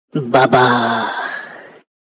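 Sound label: explosion default; kopa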